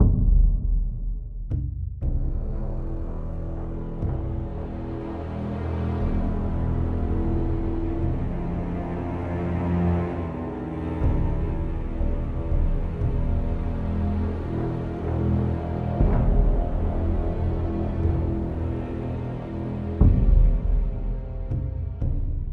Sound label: Loop Tense